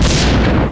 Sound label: tank gun